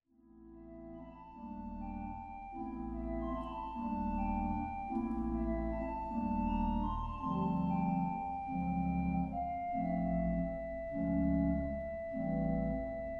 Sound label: flute song